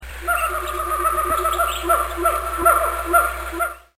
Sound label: Jungle